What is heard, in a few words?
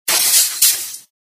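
Glass break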